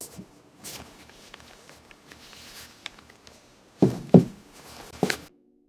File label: adjusted his leather, apartment